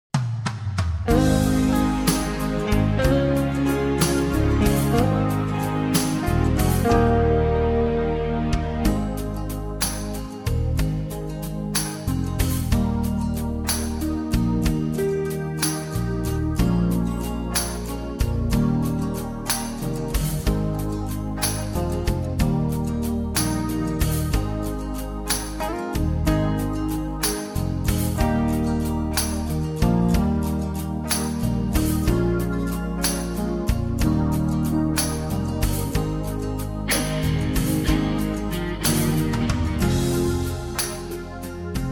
No Backing Vocals, (Ab)

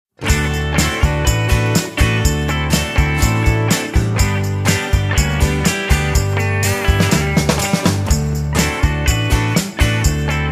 Guitars, No Backing Vocals

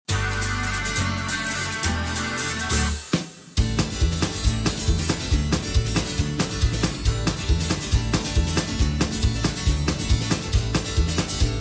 (D) No Backing Vocals

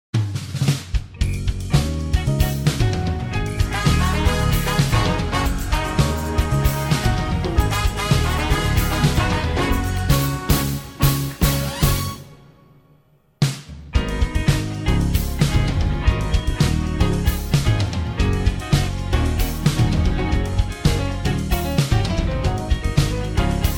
Gb To G No Backing Vocals, backing tracks

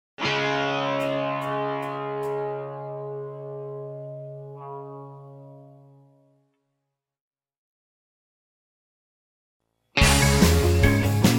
Db No Backing Vocals, backing tracks

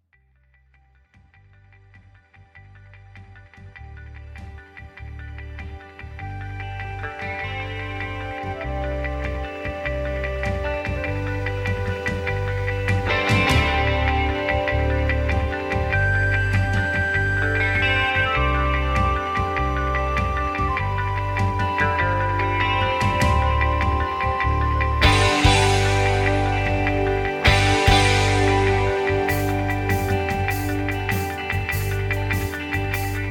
G No Backing Vocals, backing tracks

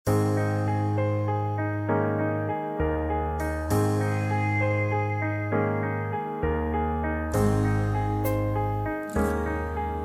(Ab) No Backing Vocals

